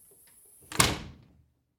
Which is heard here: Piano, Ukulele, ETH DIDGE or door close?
door close